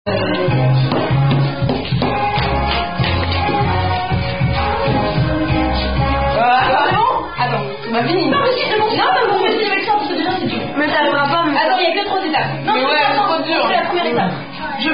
French Version; Captain Paf; French Version Of Are You A Cardinal

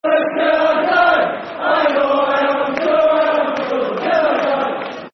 Football Chant (Soccer Song); Sunderland Till I Die; Sunderland Safc

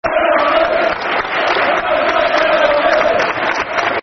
Football Chants (Soccer Songs); Gareth Southgate's Army; Middlesbrough Mfc

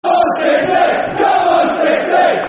Football Chants (Soccer Songs); Come On City; Hull City Hcfc

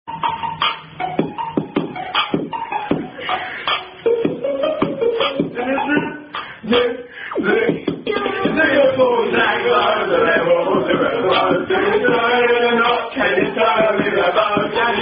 Duet

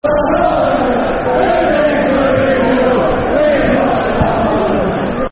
Football Chants, Bolton Wanderers; We've Got Sam Allardyce; Bolton Wanderers Bwfc

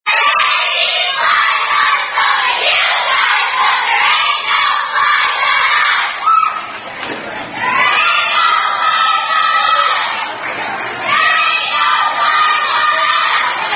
Children's Chant; Aint No Flies On Us

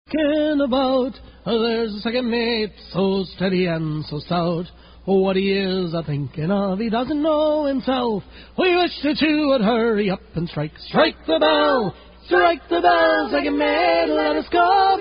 Strike The Bell

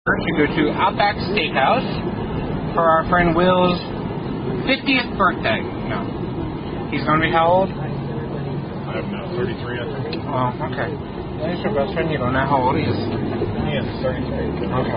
Happy Happy Birthday; An Evening With The Gang, Part 01; This Birthday Song Is Short (Fragme

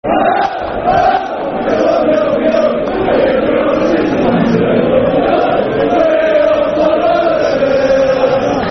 Football Chant; Hello, Hello; Aston Villa Avfc

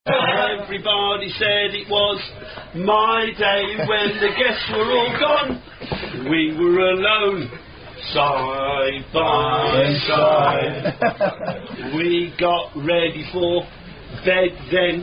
Singing, Side By Side

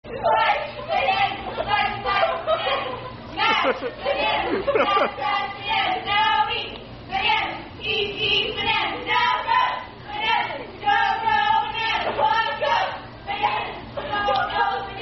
Song; Cold Stone - Banana Song; Coldstone Creamery

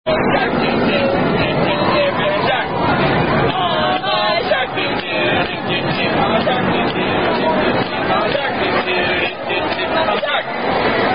The Shark Song, Attack Song

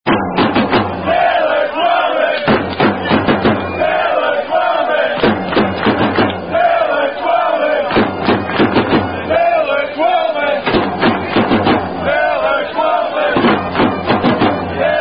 Football Chant

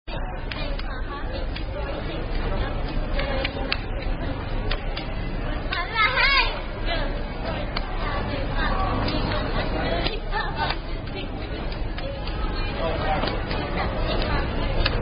Just Claping, Apples On A Stick

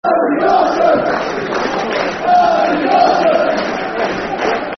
(Clap) Football Chants (Soccer Songs); Andy Dawson; fanchants; Hull City Hcfc

